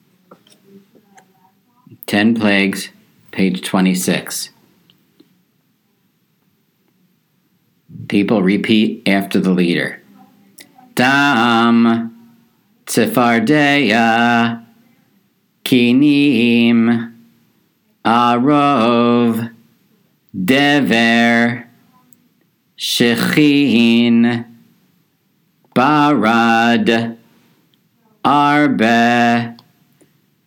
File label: Ten Plagues; Passover Seder